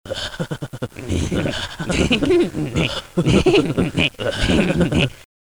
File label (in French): Rire bizarre